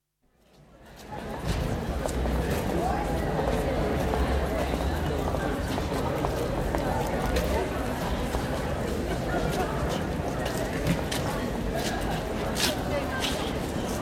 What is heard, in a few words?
Crowd, exterior, expectant crowd in large open courtyard, with footsteps, occasional close voices and distant traffic; Crowd Sound Effects; BBC